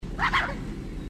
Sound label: startled grunts